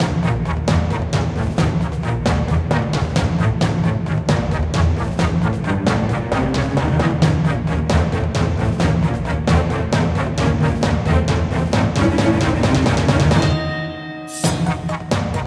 Emotional Bossfight Freude

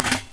Trash Can; 101-in-1 Explosive Megamix; WiiWare

